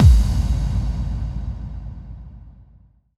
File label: boom; osu